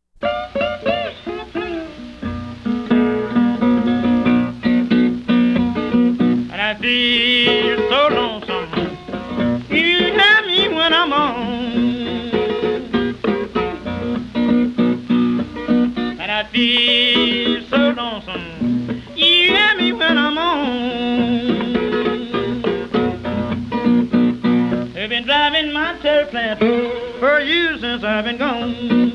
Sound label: Blues